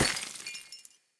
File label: ice Break; netent; magicportals